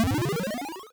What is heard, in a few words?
level up; Shattered Sword